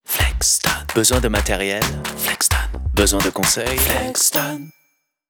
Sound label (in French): LOGO SONORE; FLEXTON